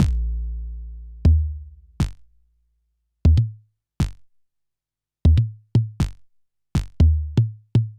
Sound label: Analog Rytm 120 BPM